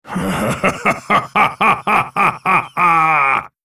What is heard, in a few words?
laugh; freak fortress 2; volibear